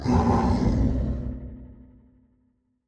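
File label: Damage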